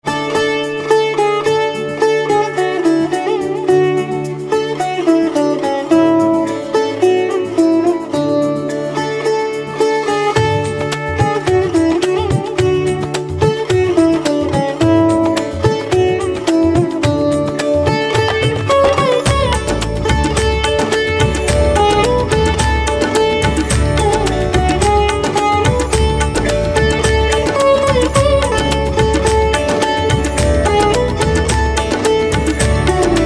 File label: Veena, instrumental ringtones